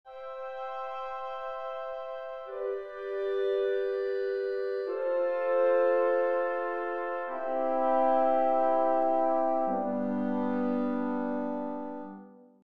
woodwind threes; Nikolai Rimsky Korsakov

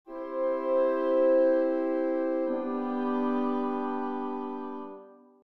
woodwind threes; Nikolai Rimsky Korsakov

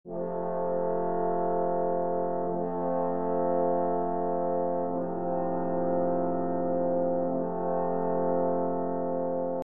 4-part brass; Nikolai Rimsky Korsakov

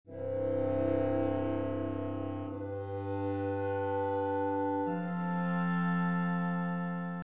woodwind; Nikolai Rimsky Korsakov

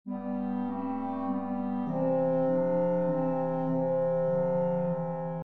chord progression; Nikolai Rimsky Korsakov

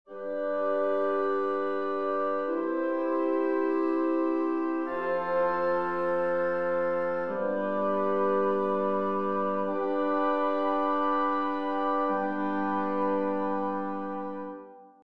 woodwind pairs; Nikolai Rimsky Korsakov

